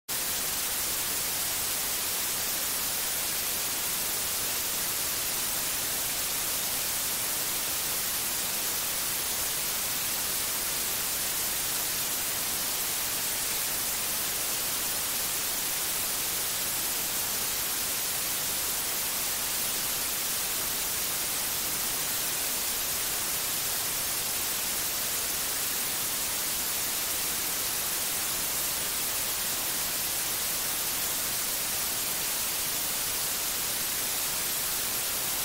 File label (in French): bruit blanc; Etude détaillée des acouphènes